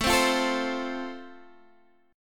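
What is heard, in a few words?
A Minor Sharp 5th, Guitar DADGAD, chord strum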